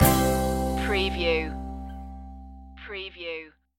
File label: put a smile on stinger